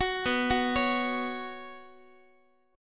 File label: B Suspended 2nd, Guitar; Bsus2 arpeggiated; x,x,9,9,10,12; 4A,4E,5A,5D,5G#,6C#; JGuitar